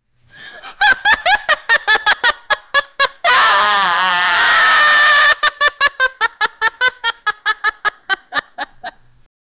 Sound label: lafing, chuckling